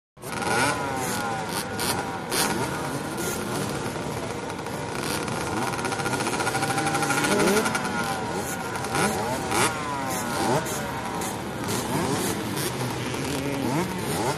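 Start Ambience 50 Cc, Bikes Idling, Revving, Then The First Heat Race Away For The Start Of The Race, Followed By The Second Heat, Shot Cu From The Starting Line.; Motorcycle Motocross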